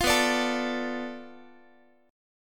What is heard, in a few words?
B Minor 7th Flat 5th, Guitar; Bm7b5 strummed